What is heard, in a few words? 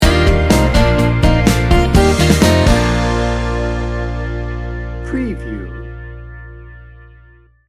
winning hand sting bumper